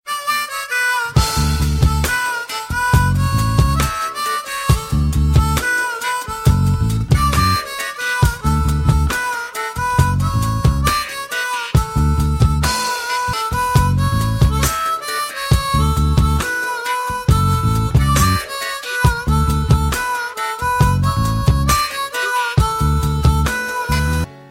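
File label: harmonica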